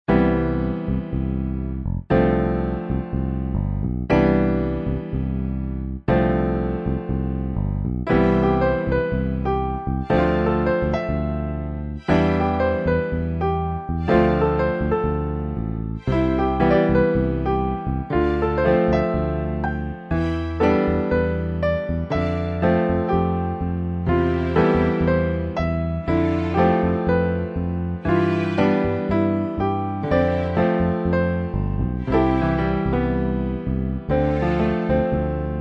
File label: prayer promo music